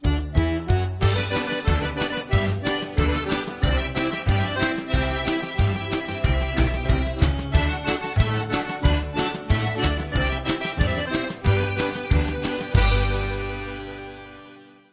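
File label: Accordion, Upbeat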